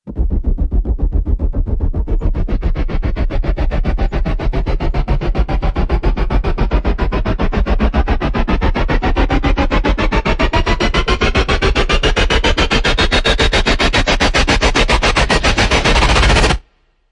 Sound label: Diced Rise Chopped Rise Only; Ninja Tracks